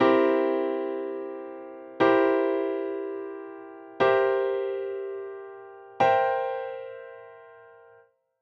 chords 4 notes inversions

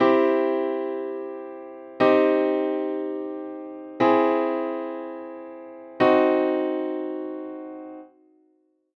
chords 3 notes; triades

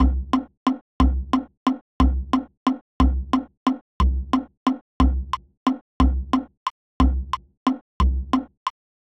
meter accents compound

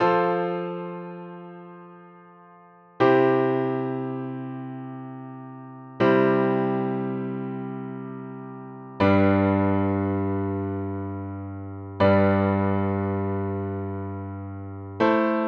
cadence others, cadencias

